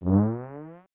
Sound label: egg flies through air; smw2